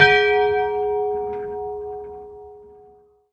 church bell, monastery; soundscapes

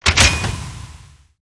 elephant gun reload back eject; gow; fortifications

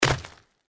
giant rat land; npc

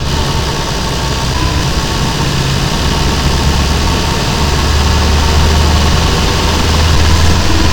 fourth cruise loop, vehicles; hedgehog

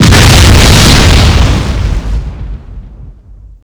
explosion; balor

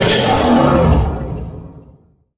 injured

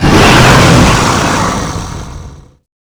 dragon shout long

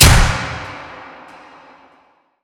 arena lights on

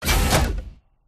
leg lift; gow; silverback

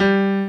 piano

